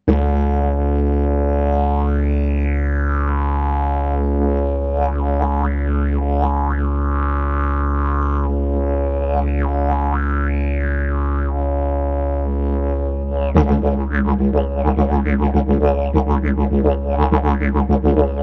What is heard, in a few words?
groove